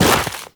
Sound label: Run Deep Snow, Footsteps; Warframe; Operator